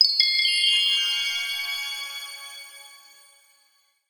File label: stats spirit reward; Armello The Bandit Clan